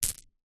foley gemstone jewel single down on others

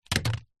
industrial duct tape ball sticky set down wood

